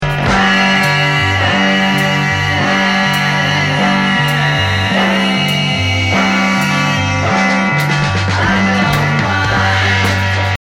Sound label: rain